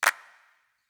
Clap, Drum Percussion Single Hits

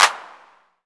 CLAPS TR8082, DRUMMACHINES

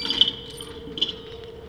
MACHINES; ASSORTED MACH; FX

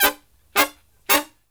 SLEEP, Killer Brass Riffs

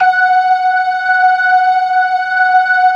LO MAN V L, ORGAN LOWER MANUAL

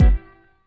BD urban, Electric Kick, Yamaha DTXpress

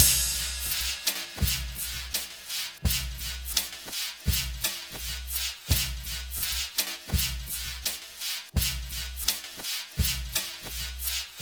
Slo Bossa 084, Lounge Drumz

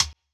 Rim, DRUM SOUNDS; ORIGINAL JUNGLE BREAKS

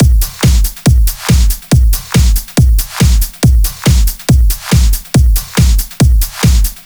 Break Beats, 140 BPM

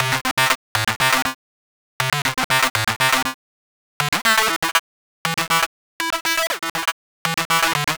Lead, Modular Electronica; Sonic Foundry (Sony Creative Software); TSS Autopilot

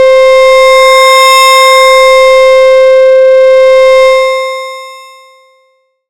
SY HUM; ModelCycles

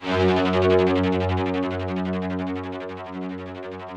ROUGH STRNGS, Yamaha VP-1; Music Store

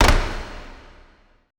E STEPS, ETH PERC

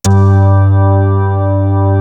CLK ORGN, organs; East West; Global Sounds

